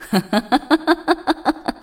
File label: laugh; Katarina